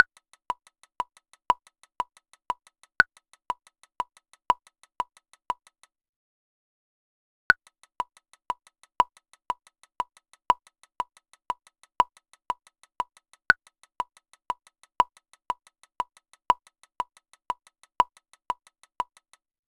Example2 Compound Meter bar grid notes meter time signature music production tempo rhythym; ableton